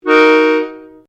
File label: PIANO ACCORDIAN G MINOR SHORT, Old Piano accordian